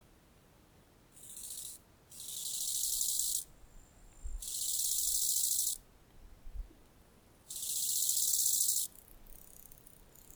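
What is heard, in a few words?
chorthippus eisentrauti; Dobratsch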